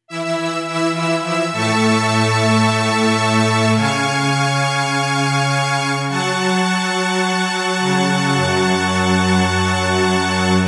pad

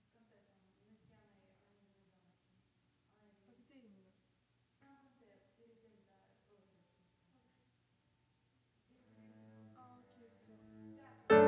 Piano

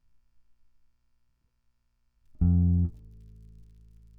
bass

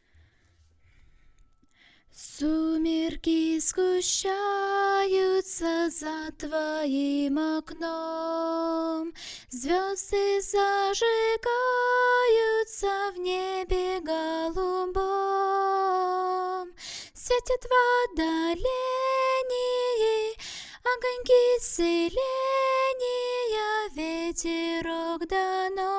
vocal

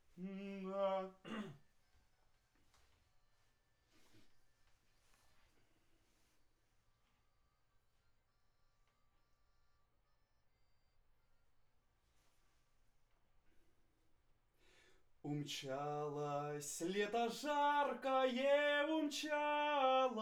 vocal add condens

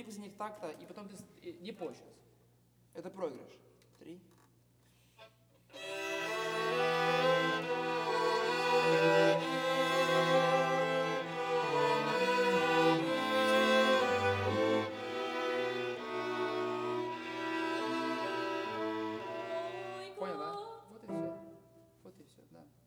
Overhead

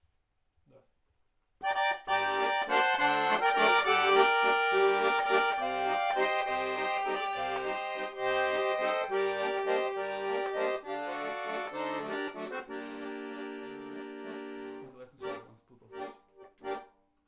guitar

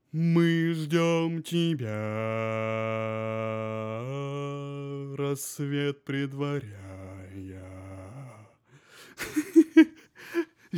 bass

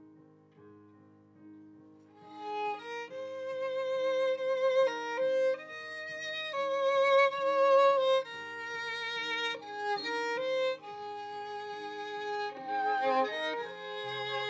Viola

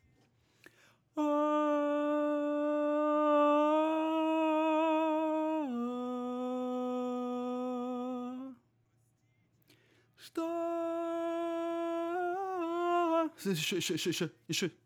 tenor

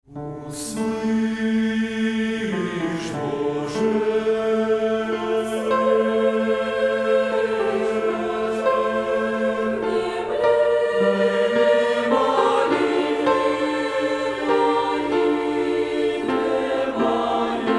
choir; Uslish

